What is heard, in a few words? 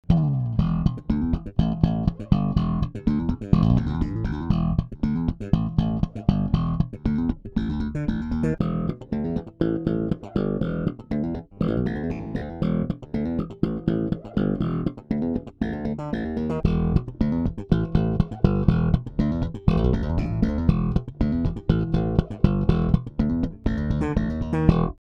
neutral DI; TTE SKC